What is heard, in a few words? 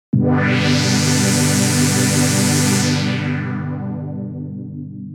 Synthwave PAD REZ, jup8